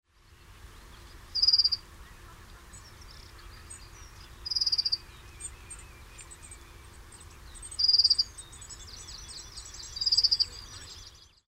dunnock

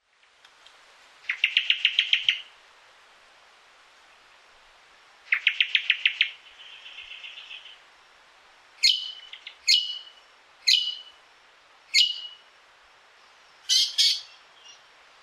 grey eared honeyeater

